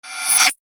Eye Of The Sorcerer Whoosh; fantasy sound effect; role playing games, magic videogames; Andrew Fleming

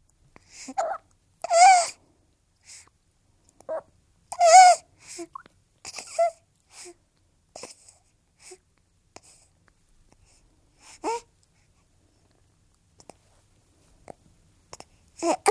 17 day old baby boy, breastfeeding; Babies; Voice; BBC

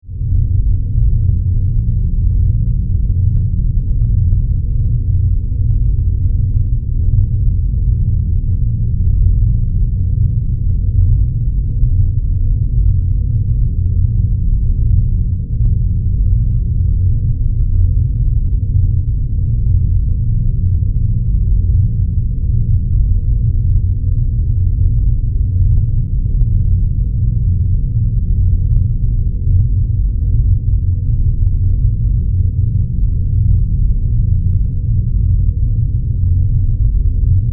Spaceship Hum, Spaceship, Hum, Modulation; 5.1

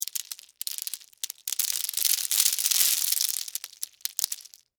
Debris Lima Beans; Texture; Debris Sound Effects; Materials

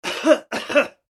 human coughing male 80 years; 80 Year Old Male Coughing; Clearing Throat

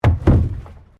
PLASTIC BARRICADE IMPACT

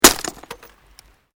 GLASS SHARD SMASH

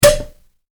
Cork: Pop From Champagne Bottle; Corks

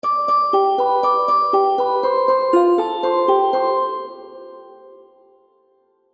Happy Baby Game Music; Cartoon Music

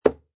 Sports Darts Impact Board; Thud Sound Effects; Objects; Lynne Music

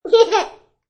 Toddler Laugh; Laughter Sound Effects; Voice

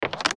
Rollover Crinkle Ascend; Mouseover; Electronic Warp; Multimedia; Blastwave

